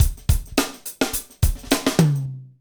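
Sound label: hip hop, Roland drum beats; Northstar - Drumscapes Roland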